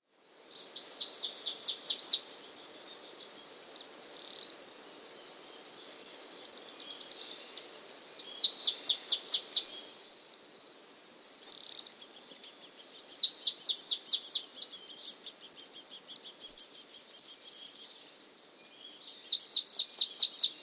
Russet Antshrike (Thamnistes Anabatinus), Andean Birds; S. America